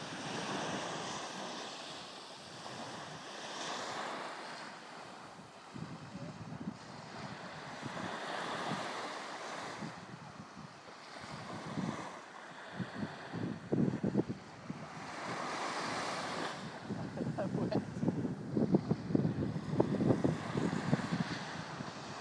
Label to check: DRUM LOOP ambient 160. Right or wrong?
Wrong